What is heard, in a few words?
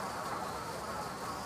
reel Spin; whos the bride